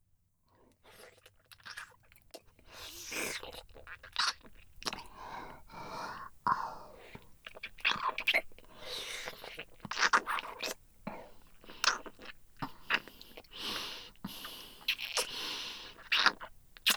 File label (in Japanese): 妻の隣で囁きながらの逆夜這い